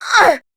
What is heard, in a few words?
Pain, Grineer Queens Guards; Battle Barks; Warframe